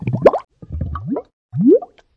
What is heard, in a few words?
Lava